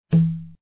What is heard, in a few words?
tup, cambodia